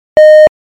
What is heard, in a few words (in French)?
son long